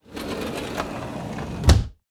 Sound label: Door Close Patio Sliding; household